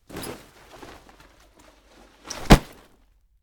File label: Dropping Backpack